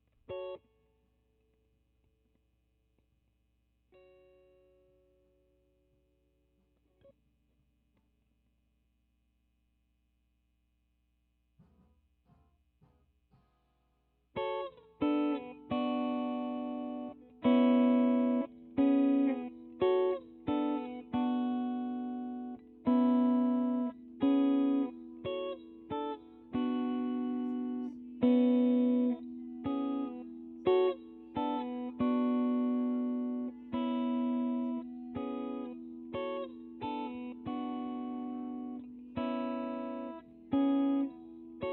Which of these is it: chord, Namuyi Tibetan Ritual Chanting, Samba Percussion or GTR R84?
GTR R84